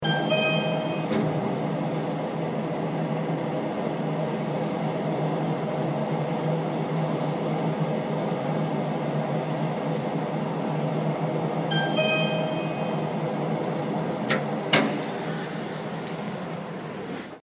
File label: elevator